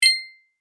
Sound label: Friendly digital ding app alert